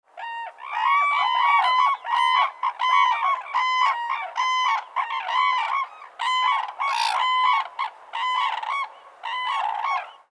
tanchou

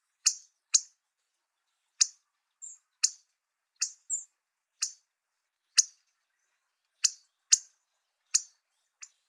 shime

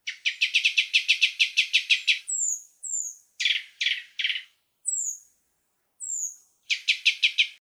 kurotsugumi

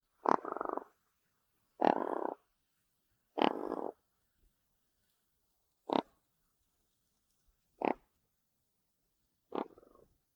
raichou, birds

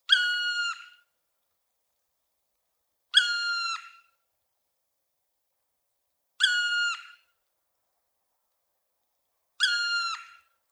kumagera

